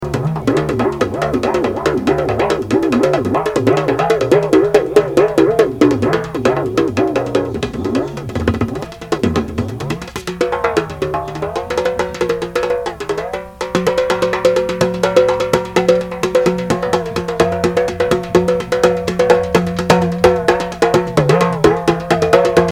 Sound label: hd high end; Variable Pitch TALKING DRUMS